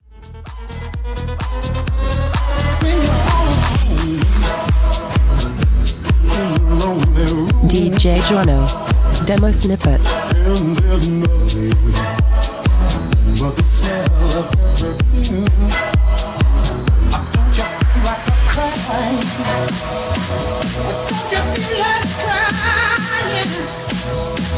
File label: 128bpm, E